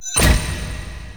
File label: stairs; Warframe; Entrati; Vaults